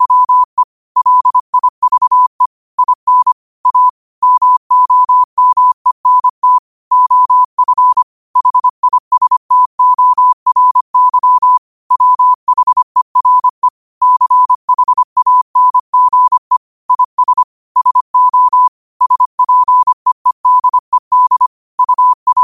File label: 5 wpm, morse